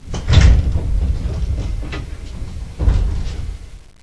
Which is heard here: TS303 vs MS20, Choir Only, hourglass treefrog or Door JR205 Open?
Door JR205 Open